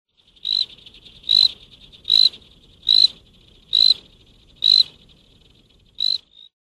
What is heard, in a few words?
Paradoxophyla palmata